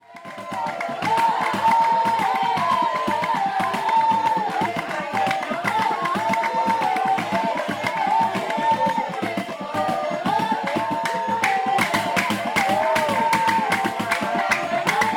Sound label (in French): polyphonie aka dzanga sangha